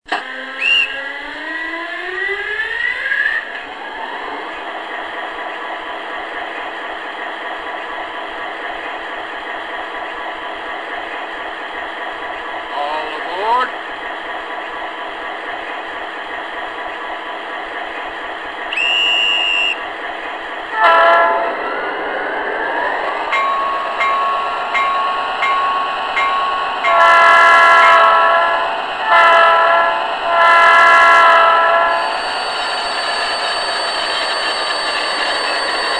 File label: vl emd gp40; loksound